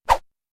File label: Swoosh; Dino Donkey Dash; Swoosh Sign